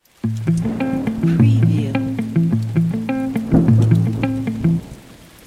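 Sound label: Arpeggio Bastaki Am Clean 105BPM, electric guitar; 6-8; beatcraftpro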